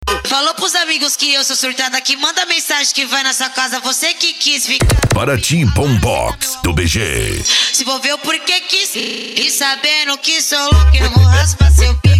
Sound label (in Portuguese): bombox funk